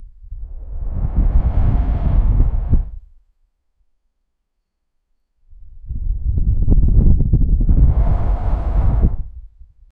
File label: the whoosh of wind as a door slams shut; man made